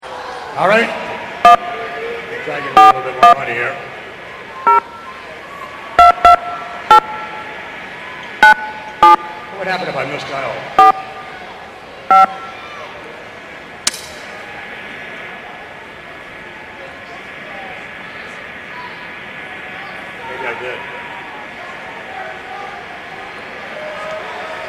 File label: two misdials and a busy signal